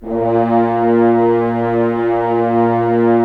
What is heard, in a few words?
Horns; Roland - LA Composer vol. 4; HZ Horns